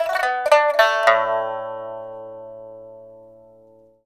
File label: PIPA PHRASES; Spectrasonics; Heart of Asia